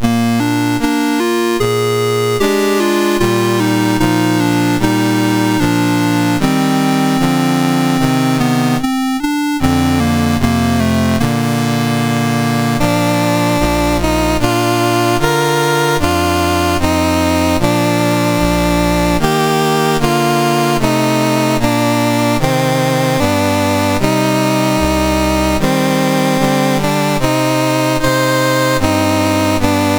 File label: Hungary, National Anthems, MOS 6581